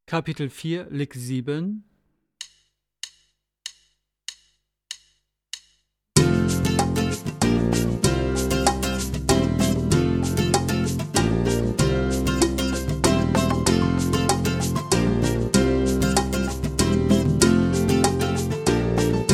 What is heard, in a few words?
Latin Style Guitar; Jьrgen Kumlehn